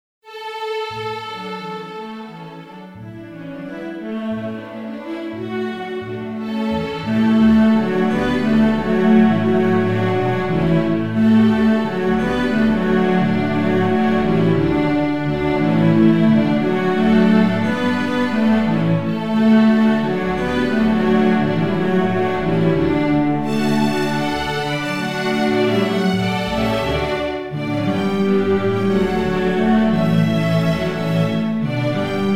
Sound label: string orchester